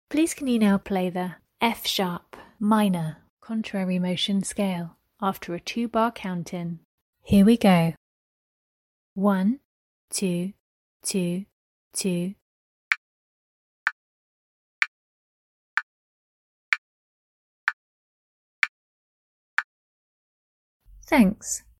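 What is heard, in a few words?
f sharp min contrary motion scale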